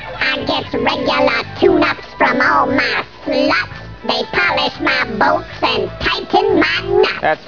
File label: tune up